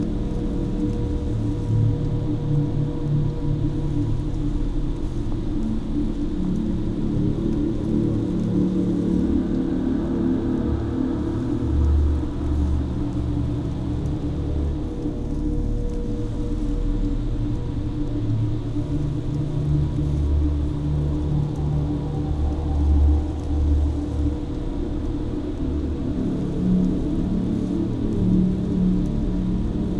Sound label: ship titan idle; ships; Stellaris; toxoids